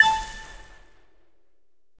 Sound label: candy Fall Low; fairyhansel